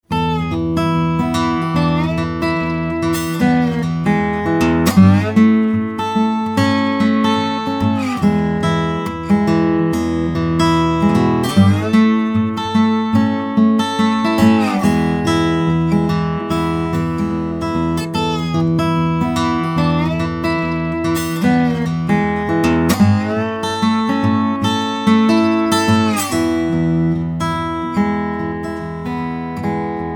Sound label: Slide Melody